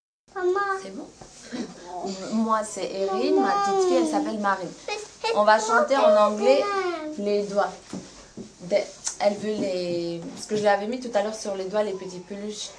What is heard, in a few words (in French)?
comptine anglaise